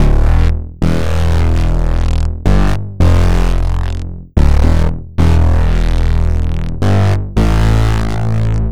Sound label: Bassline; Drums & Drones